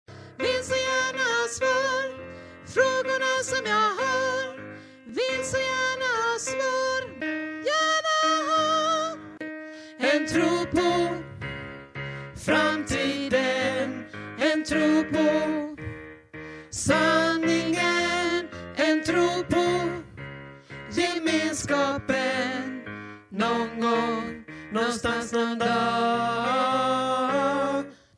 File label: tenor